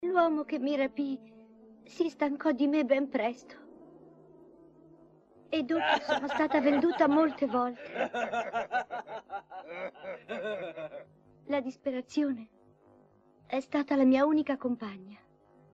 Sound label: Airy